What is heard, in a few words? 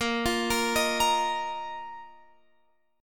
A sharp Suspended 4th Guitar Open F; A#sus4 arpeggiated; x,17,17,17,18,17